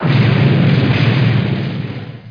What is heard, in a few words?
HITHULL, hit hull; Star Trek JR Audio